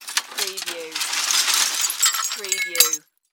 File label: Debris Plastic Glass